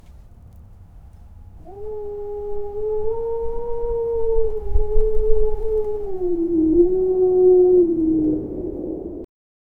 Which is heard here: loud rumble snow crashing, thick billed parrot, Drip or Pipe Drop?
loud rumble snow crashing